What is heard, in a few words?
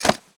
pack